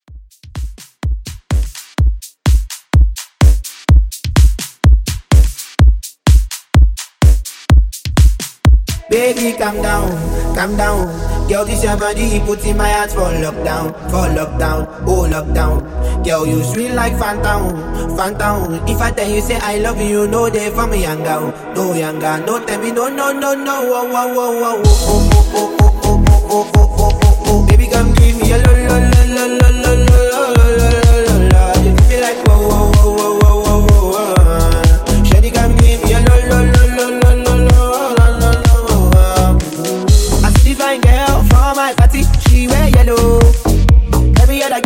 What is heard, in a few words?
Top40 Future House Mix; Remixfreakz